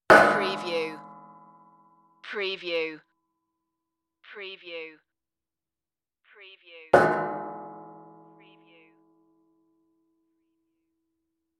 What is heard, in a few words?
sheet metal impacts